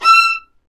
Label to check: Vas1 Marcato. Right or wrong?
Right